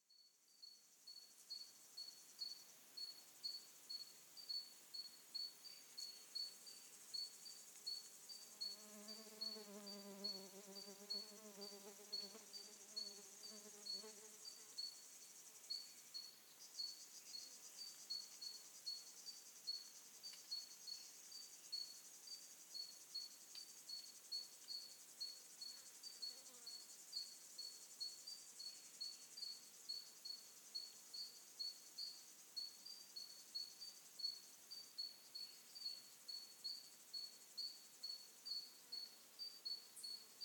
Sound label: Summer afternoon in the forest, Atlantic Forest; Veronica Cerrotta